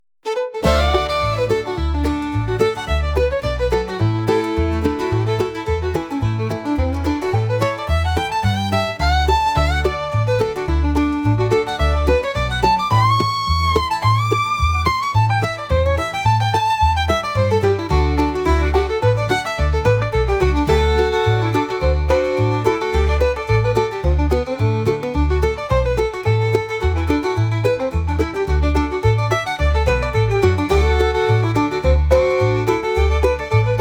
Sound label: Fiddle band